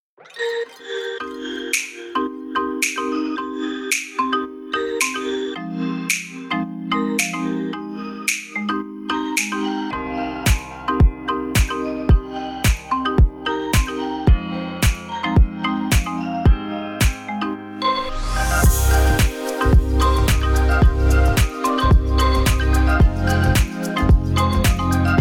Melodic Percussion Wood Full Production Marimba Pop